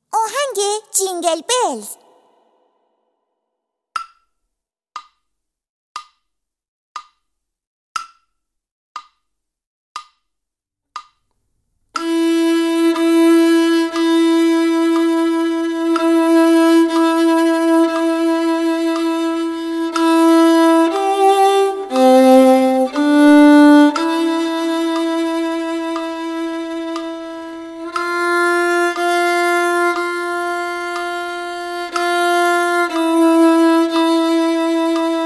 Jingle Bells; Ahange

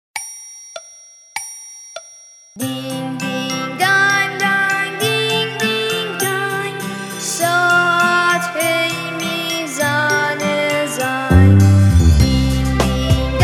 Twinkle Twinkle Little Star in C Vocal

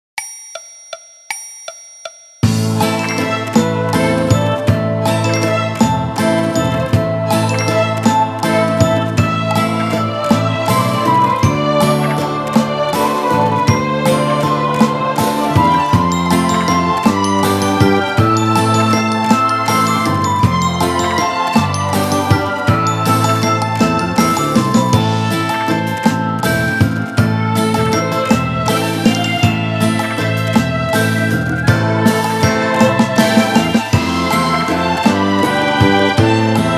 Orchestra Version

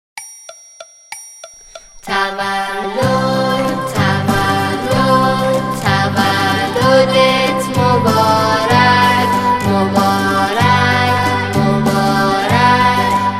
Vocal By Group

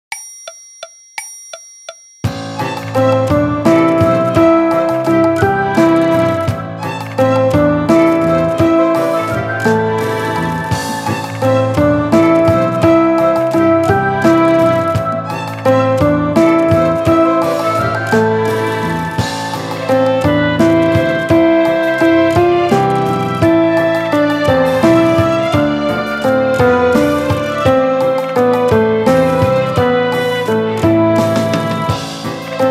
Piano Orch; Gole Gandom

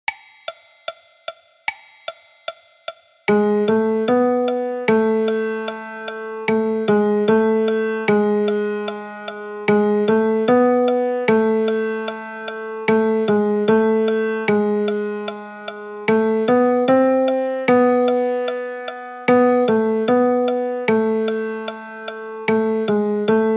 Piano Version

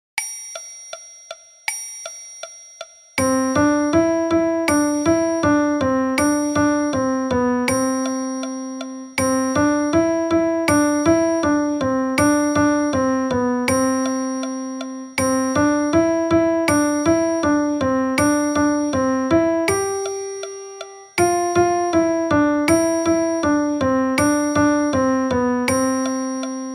Piano Version

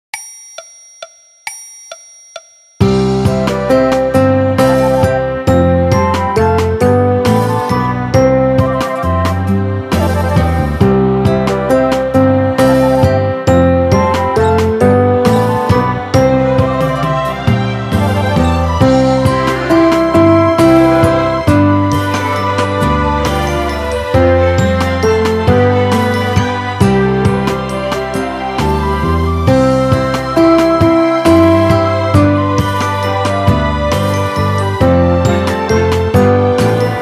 Donavazi Piano Orch

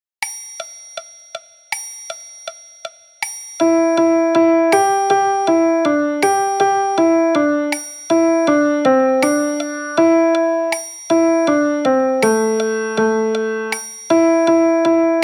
Piano Version

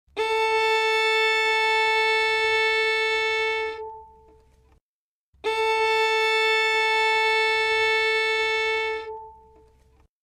tuning; ويلن; کتاب هشت قطعه زيبا و آسان براي ويلن

